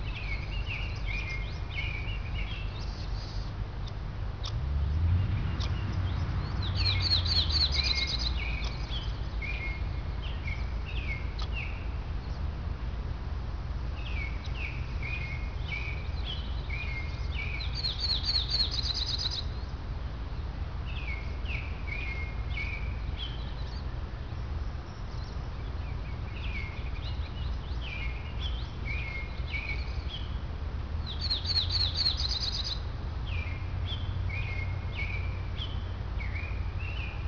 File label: ambient